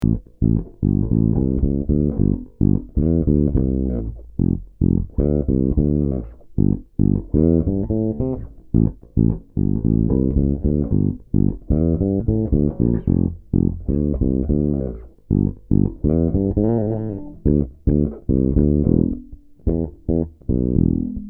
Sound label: Fodera C Passif Tone Off, basses, fodera 5 cordes; Fodera Monarch Elite Custom 5 cordes